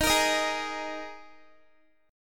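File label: E flat Suspended 4th Guitar, chord; strummed; x,18,20,20,20,x; 4E,4A,5D,5G,5C,6F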